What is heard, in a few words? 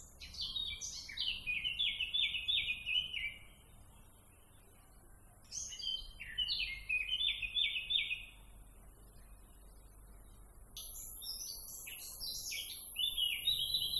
birds unknown sound